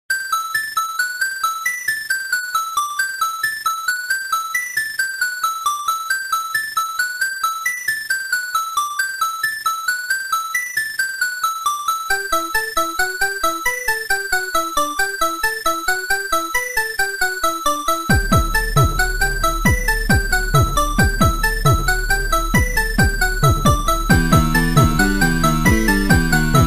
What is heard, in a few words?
8 bit